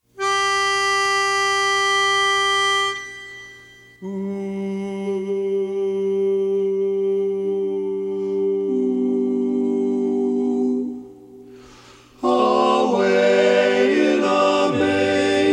baritone; away in a manger

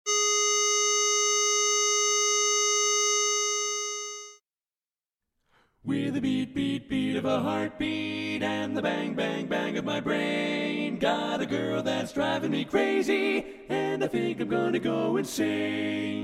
tenor, A Capella

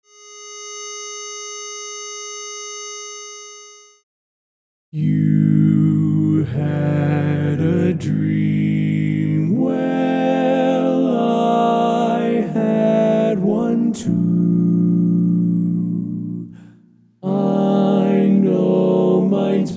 lead, A Capella

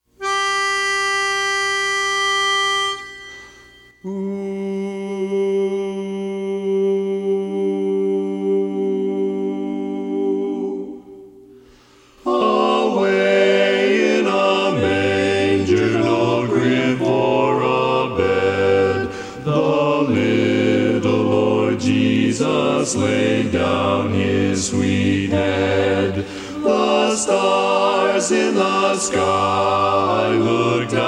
bass, A Capella; away in a manger

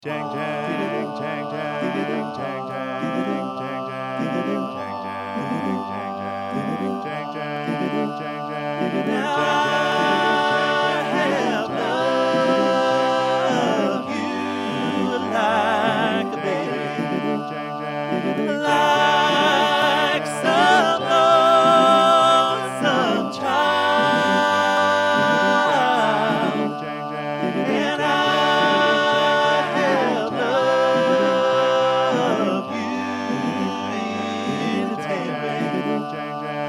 chorus, A Capella

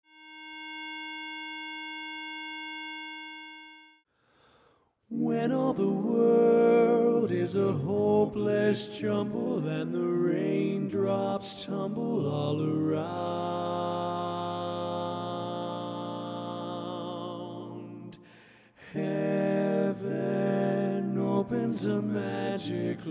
lead, A Capella

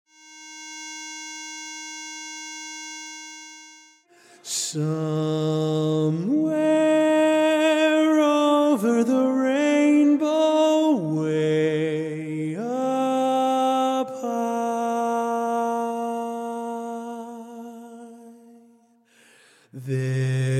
no intro lead, A Capella

